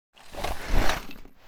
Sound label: IED Throw; zps; Weapons; Explosives